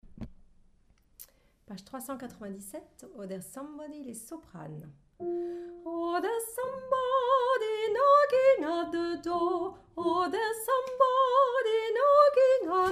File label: Soprano